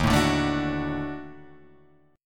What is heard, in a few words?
Eb Minor Major 7th Flat 5th, down, Guitar, Drop D